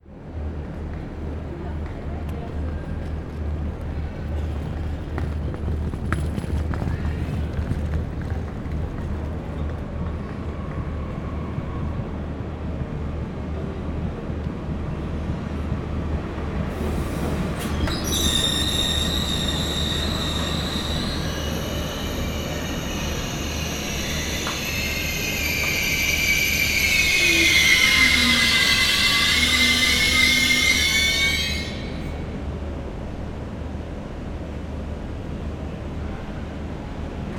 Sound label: Arriving Braking Train